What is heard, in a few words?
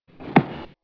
thud